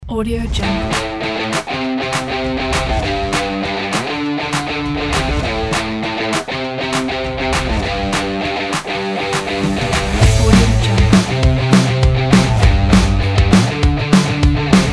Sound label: upbeat claps and indie rock